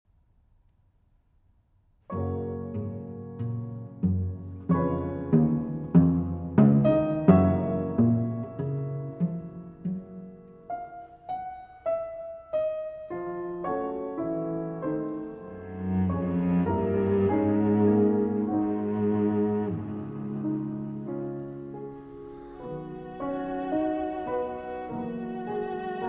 Cello Sonata, Adagio affettuoso; No. 2 in F Major, Op. 99 II.

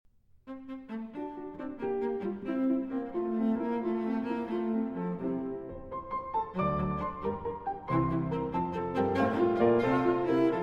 Sonata in F Major, Rondo Allegro Vivace

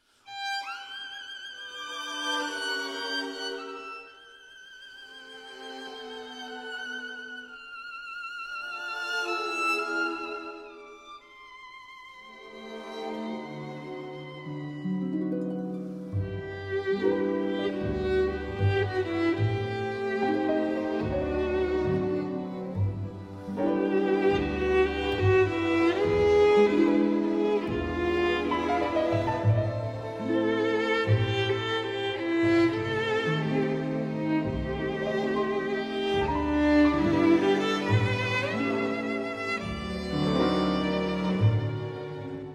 For Solo Violin, Harp, Piano and String Orchestra

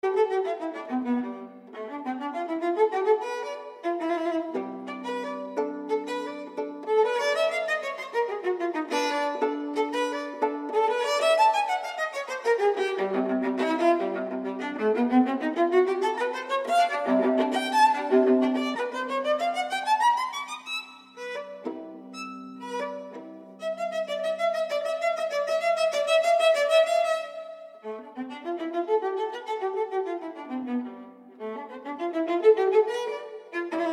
Unaccompanied Viola, Gigue

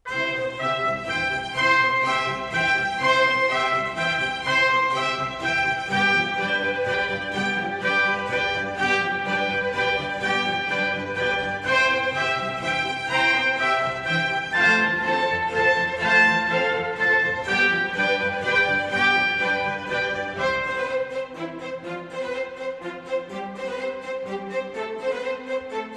Concerto for 2 Trumpets in C Major, Allegro; Vivaldi; RV 537 III.

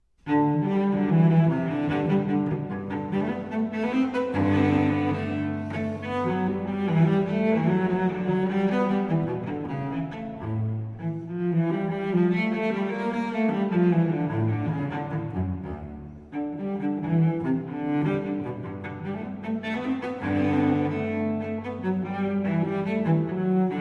Cello Suite No. 1 in G Major, BWV 1007; J.S. Bach

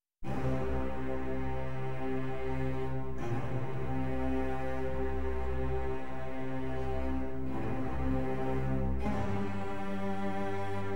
Symphony in C Minor, Adagio – Allegro – Adagio

